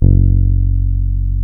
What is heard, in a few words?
BASS F#0, Electric Basses; PlatinumPhattCD2; E-MU Producer Series Vol. 8 – Platinum Phatt